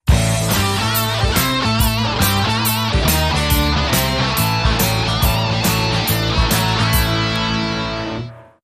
Boogie

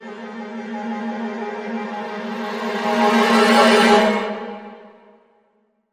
Eccentric Thrilling Maj Cres

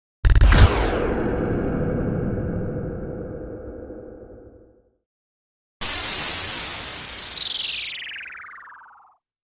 Stick hit Fly By

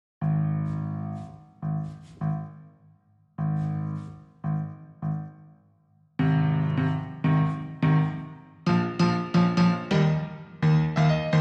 Piano Clean

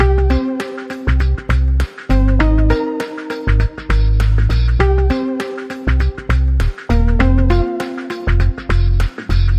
Neutral Bounce, Loop

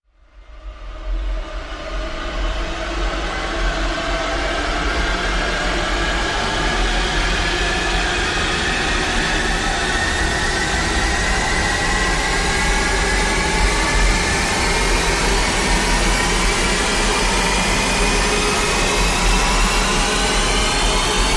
Rise Long Quick Layers

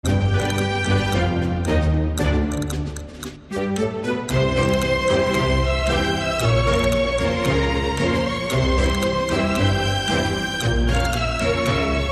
Pasodoble

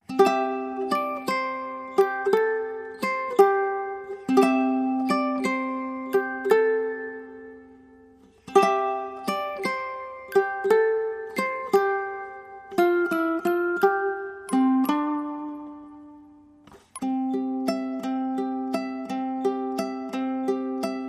Lullaby Version (Ukulele and Glockenspiel Only)

